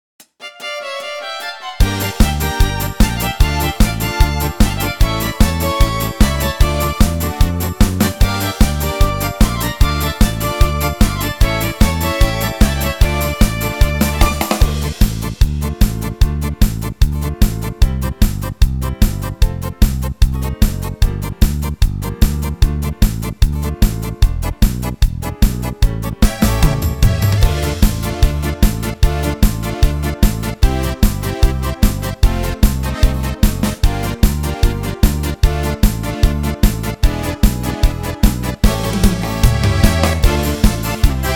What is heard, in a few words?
Polka